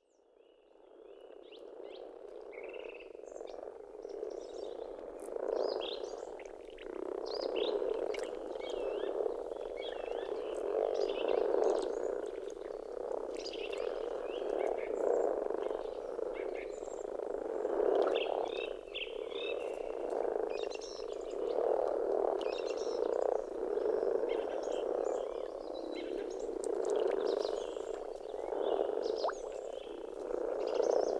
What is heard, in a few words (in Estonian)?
rohukonn